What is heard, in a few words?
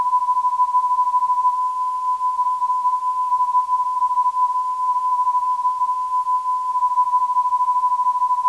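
348kHz, non-directional beacon; SCL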